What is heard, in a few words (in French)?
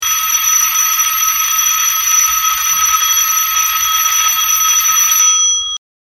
sonerie sans cloches, reveil mecanique; dore; bayard